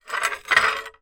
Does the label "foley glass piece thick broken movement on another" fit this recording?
Yes